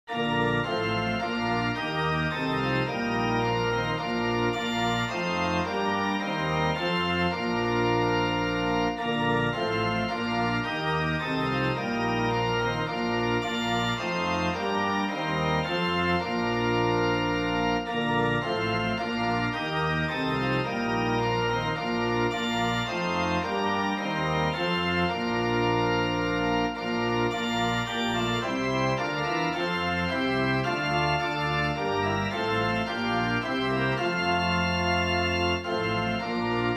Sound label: organ; All Glory Laud and Honor